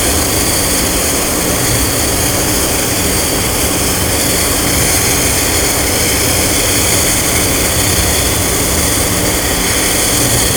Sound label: Aircrafts Engines Mig Around Engine; DCS